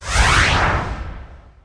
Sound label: object slide out; Earth and Beyond; EA Games